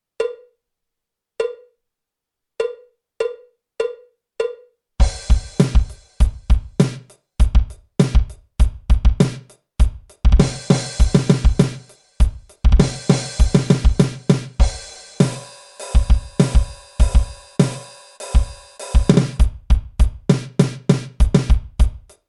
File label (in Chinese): 架子鼓独奏 爵士融合; 66条架子鼓独奏SOLO